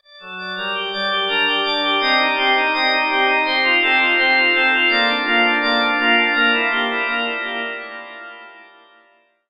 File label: Ganymede Organ Delta